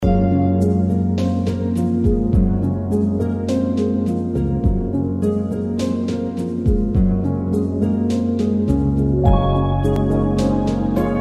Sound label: loop; loddlenaut; musicloops